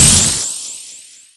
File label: Shoot Sound; Zelda's Final Smash; Light Arrow